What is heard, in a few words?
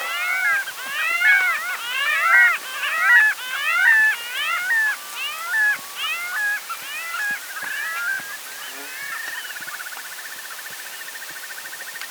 Parasitic Jaeger Stercorarius parasiticus